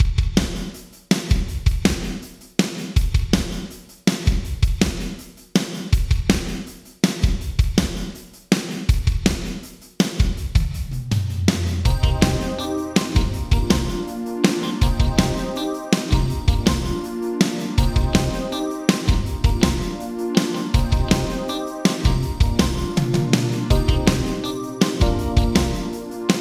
Drums Yamaha ProR3 Large Hall PD4ms 0.7s; Vintage Digital

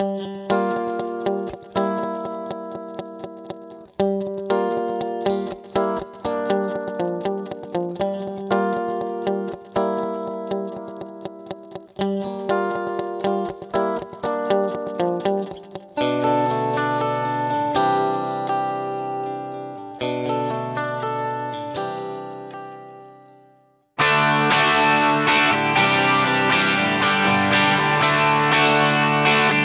Guitar Yamaha Rev7 Stereo Echo; Vintage Digital

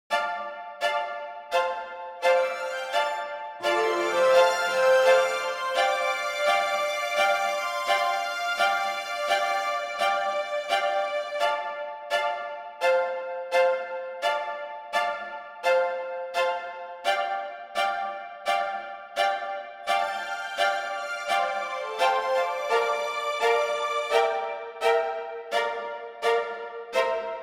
Strings, Konzerthaus

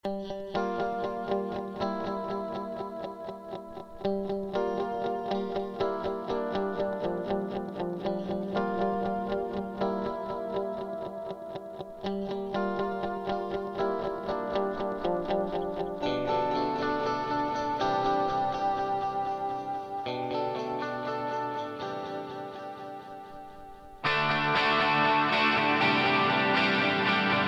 Guitar Dynacord PDD 14 Modulated Delay; Vintage Digital

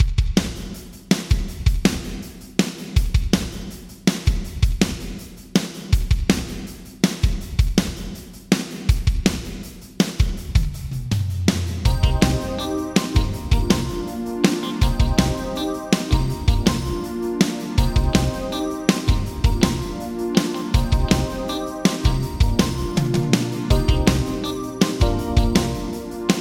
Drums Yamaha ProR3 New Hall; Vintage Digital

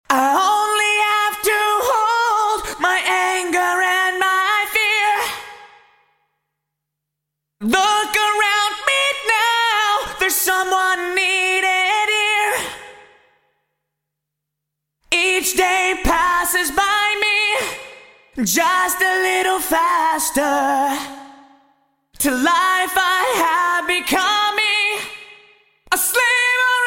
Vocal Yamaha SPX900 Room; Vintage Digital

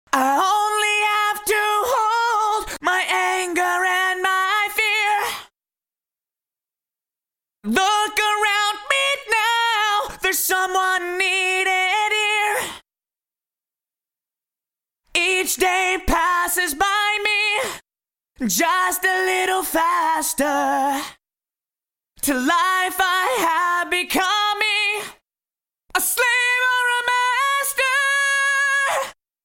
Vocals Dry; Vintage Digital

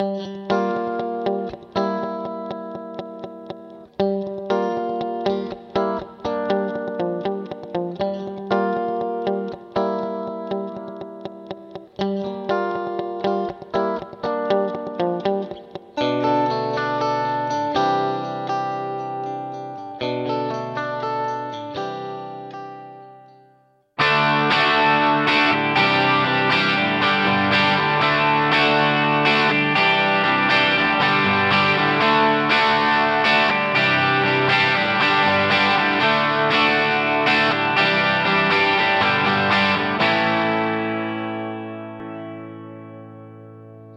Guitar Yamaha Rev7 Spring; Vintage Digital

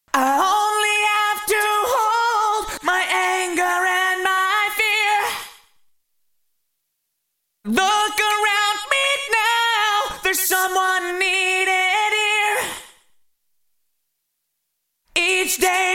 Vocal Dynacord PDD 14 Short Clean Delay; Vintage Digital

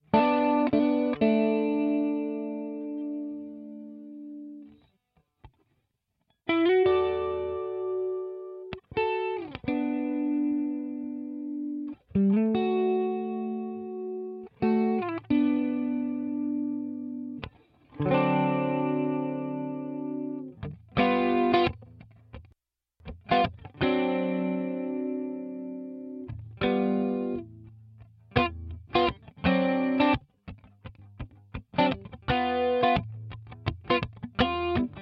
Jazz Guitar New Sound

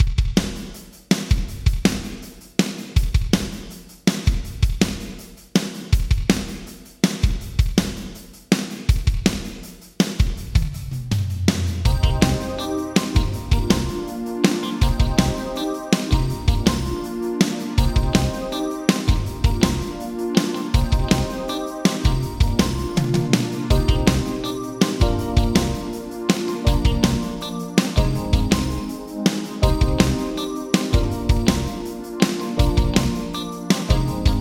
Drums Yamaha Rev7 Large Hall 1.0s Diffusion 10; Vintage Digital

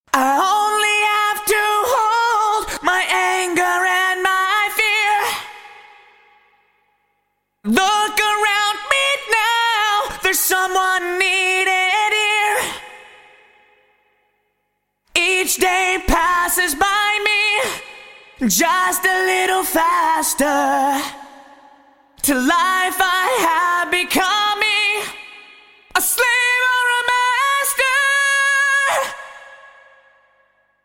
Vocal Roland SRV 330 Lex Hall; Vintage Digital

